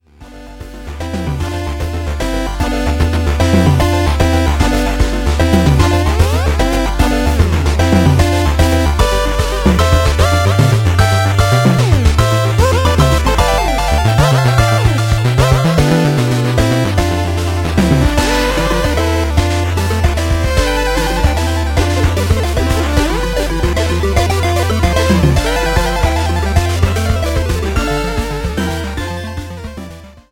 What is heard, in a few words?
sample